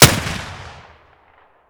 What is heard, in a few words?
winchester1300 muzzle mono distant, weapons; mossberg